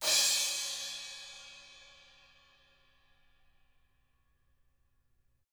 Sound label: CRASH 16